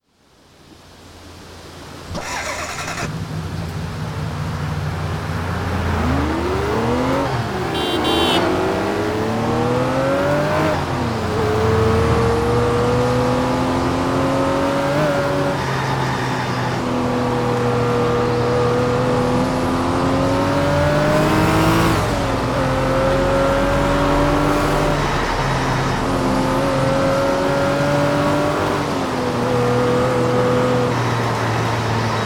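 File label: ruf rk spyder, car; TDU-1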